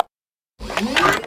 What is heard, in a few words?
UI Fast Travel Local Close; Taps to Riches; android